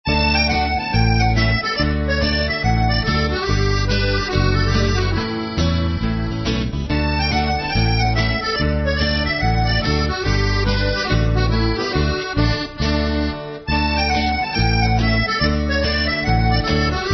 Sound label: Hornpipes, folk